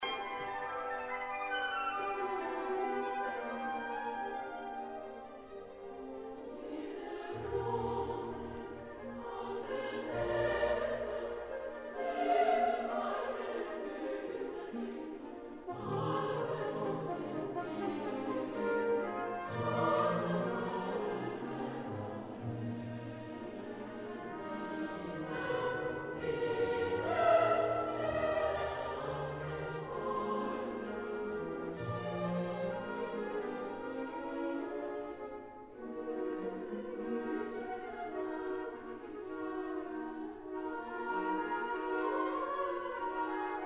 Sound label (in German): Chor der jungeren engel; Mahler; Symphony No.8